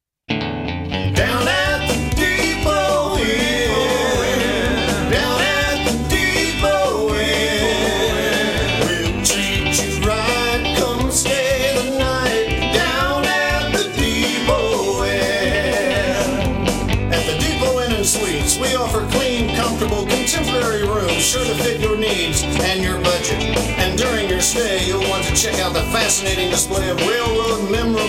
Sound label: jingle; depotinn